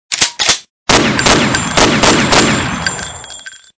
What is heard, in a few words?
Gun Shot